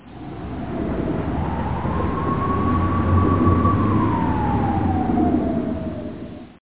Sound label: traffic; SWARRIOR